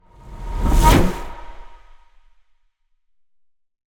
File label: Ice Spell Mobility Teleportation Frost Blink; Mobility & Teleportations; Arctic Aura